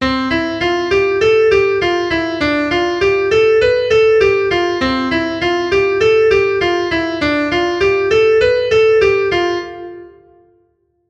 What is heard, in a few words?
Pattern C to Dm